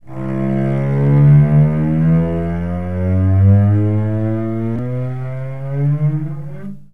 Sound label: slide up, cello